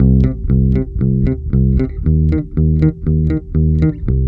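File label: INDY BASSE, 119 bpm; USB Soundscan vol.32; Roots Disco; AKAI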